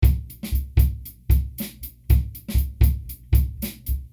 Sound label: groove